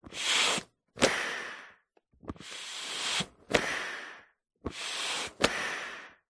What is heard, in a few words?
industrial pump foot pump pumping slow; zapsplat